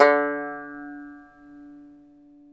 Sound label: banjo D2C; InVision Interactive; Lightware VOL-1; Instruments & Percussions; BANJO ONE